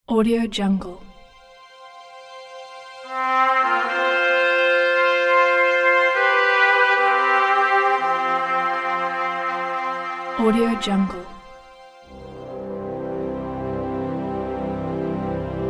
honor ident

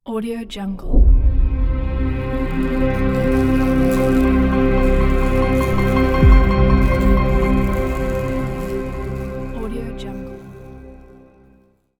electric logo

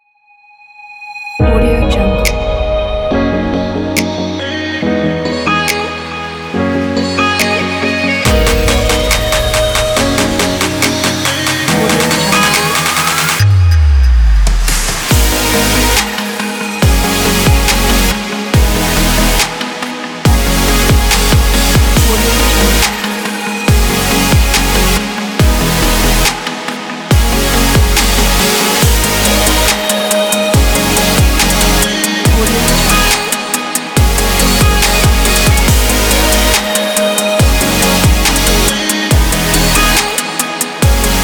the dubstep

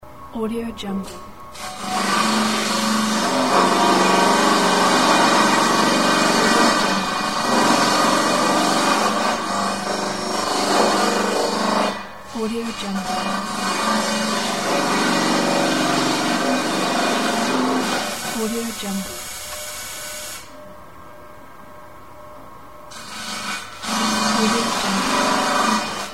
lumber mill ambience

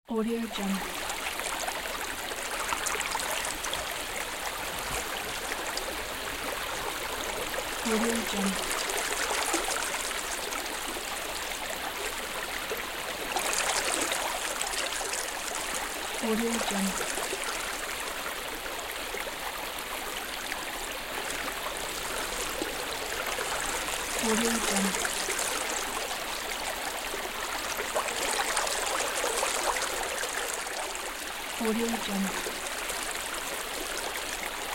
water

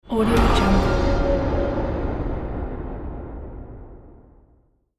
magic explosion

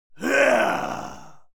human vocal male roar confident

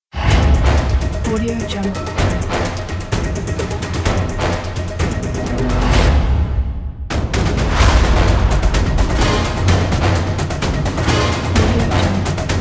epic trailer logo; scorponus; premierbeats

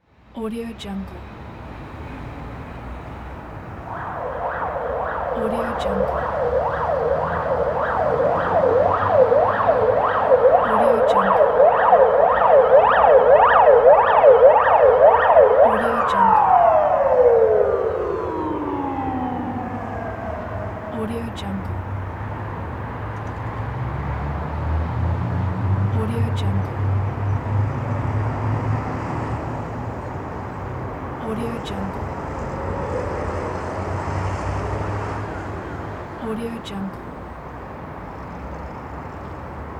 ambulance siren in city traffic

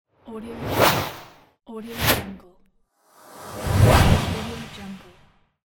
swish

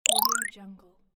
confirm

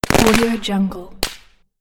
firecracker

